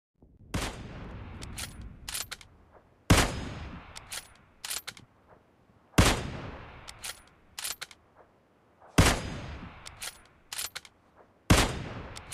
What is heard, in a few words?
kar 98 gun sound ringtone